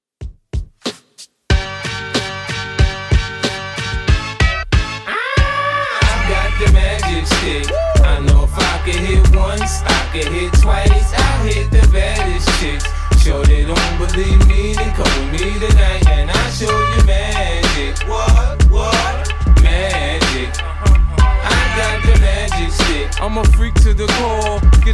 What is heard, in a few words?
Hiphop Redrum 93bpm